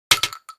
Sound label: 12cal shell metal, shotgun; weapons sound; bullets